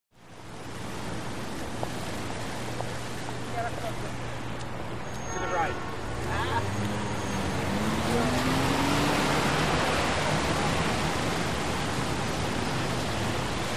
Wet City Traffic Bys W

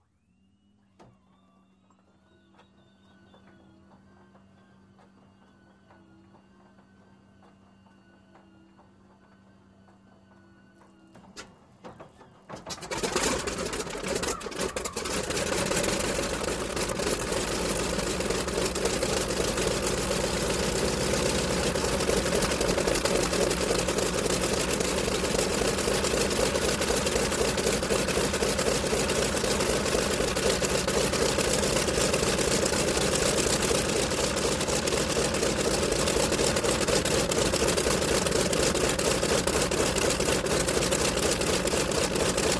Airplane, Internal Sounds W Pneumatic Whines.

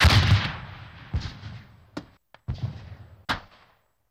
Grenade Launcher

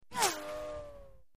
Left To Right Tonal Bu, bullet bys

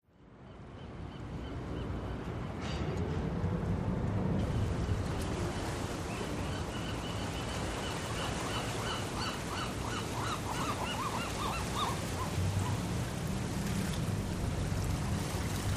Ferry Boat Slow Depar

